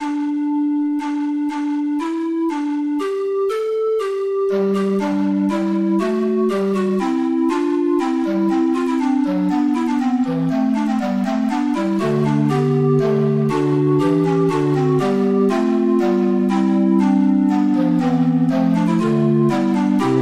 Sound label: organ